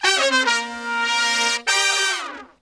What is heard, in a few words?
BRAZ LICK, BRASS LICKS